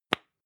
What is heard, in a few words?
lipstick open plop, bathroom; haus-garten; badezimmer